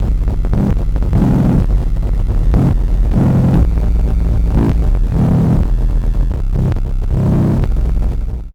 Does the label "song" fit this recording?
No